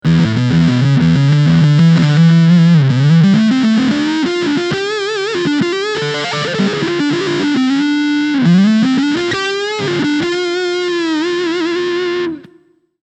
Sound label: Fuzz